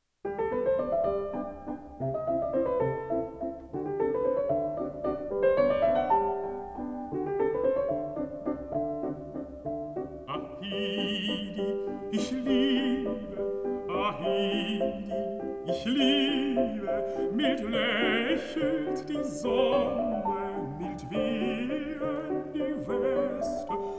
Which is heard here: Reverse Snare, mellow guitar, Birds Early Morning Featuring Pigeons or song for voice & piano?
song for voice & piano